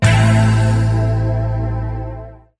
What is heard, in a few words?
cymbalic, Synths; Notes